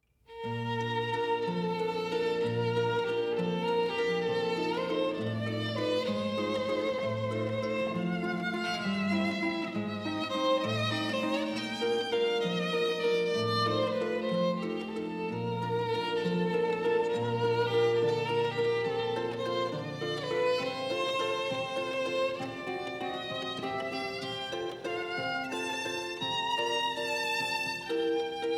Violin & Harp

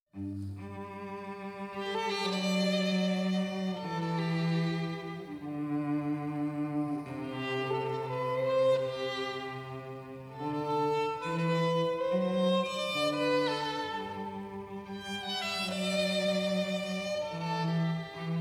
Duet of Violin and Cello

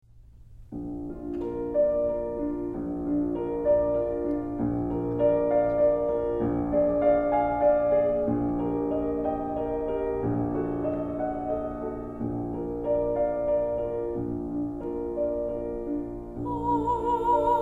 Soprano & Piano; Ave Maria, Schubert

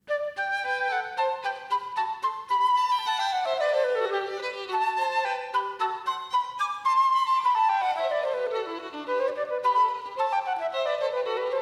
Duet for Flute and Violin; Beethoven, Allegro from Duet in G Major

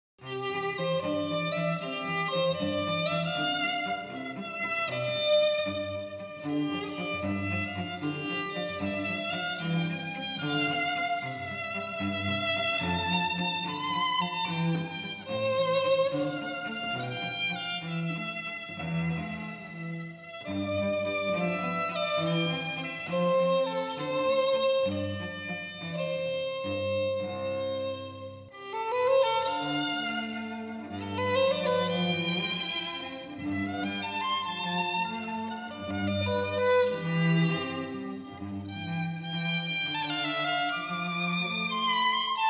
Waltz, Duet of Violin and Cello; Lehar, Waltz - Violin & Cello

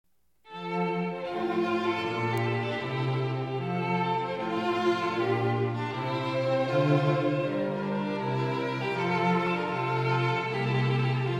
Watermusic Selections; G.F. Handel, Air and Hornpipe from Watermusic - String Quartet/Oboe

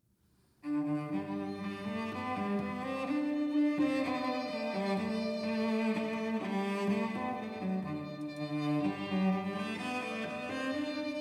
A Quaker Melody by Duet of Violin and Cello; Simple Gifts

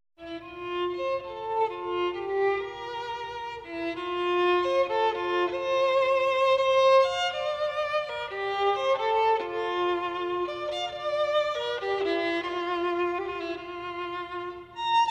Solo Violin

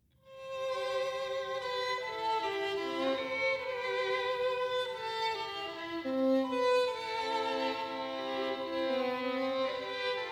Duet of 2 Violins

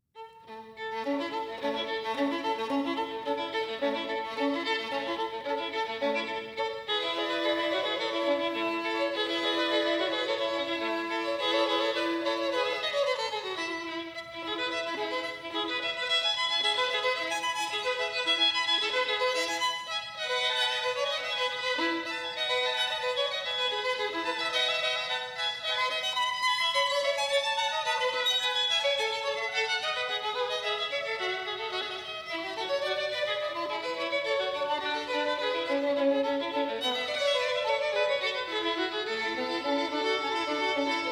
Allegro, Duet for 2 Violins; LeClair, Allegro from Sonata for 2 Violins in A Major

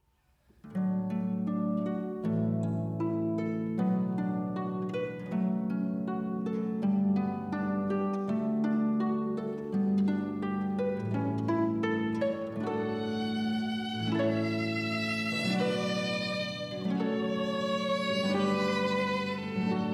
Duet of Violin and Harp; Pachelbel, Canon in D

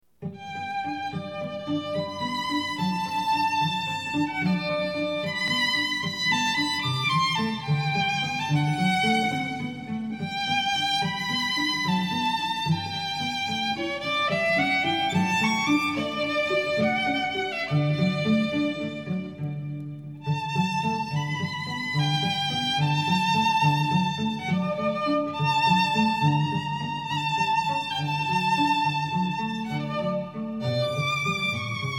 Duet Violin and Cello; Hummel, Romance

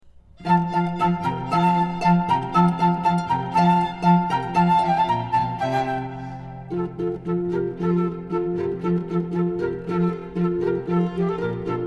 Flute, Violin, Harp and Cello; Vivaldi, Allegro from Autumn, The Four Seasons

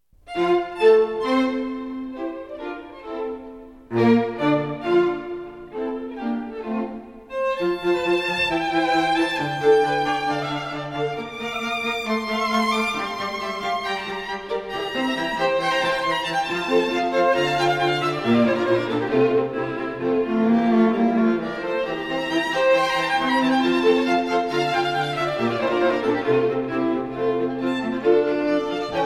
Allegro, String Quartet; WA Mozart, Allegro from Divertimento in F Major

